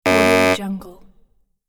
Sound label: dull wrong; interface sounds